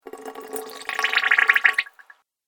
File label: Water Fast Little Bubbles; Water Sound Effect; Splish, Splash, Splosh!